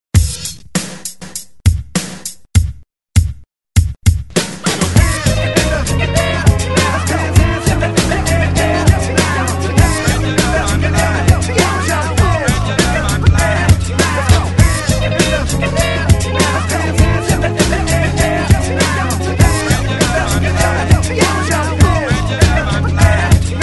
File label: 90s Hype Re Drum 100 Bpm; DJDOLLS